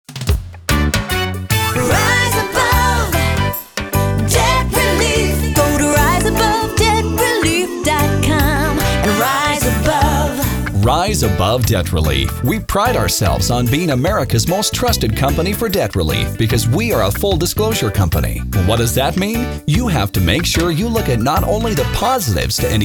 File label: Debt Relief, debt relief jingles; Rise Above Debt